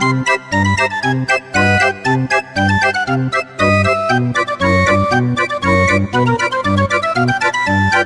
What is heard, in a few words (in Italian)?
Musica Pagliaccio